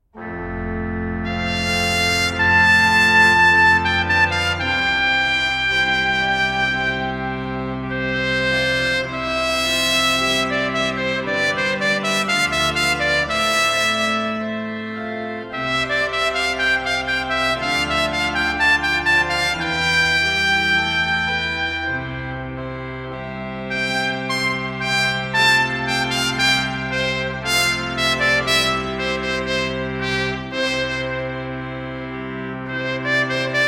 Sonata Prima per tromba sola Adagio, Trumpet And Organ; 'Capricci armonici da chiesa e da camera'; Viviani; Sonatas For Trumpet And Organ